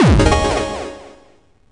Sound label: net clean; stardunk ios gamerip